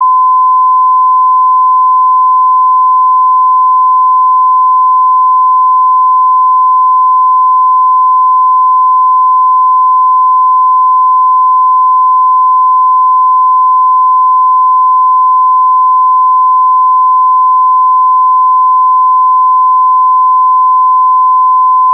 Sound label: 1020Hz, test tones